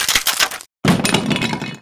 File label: pan break; weapons